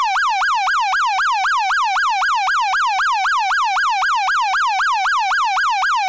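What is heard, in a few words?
Federal Signal MS4000 Wail, siren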